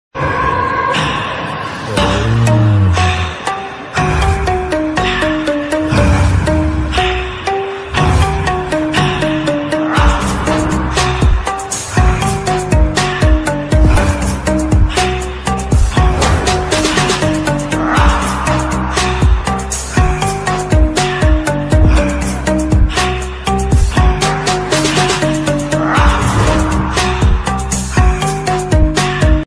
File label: tune ringtone bass boosted; zombie reddy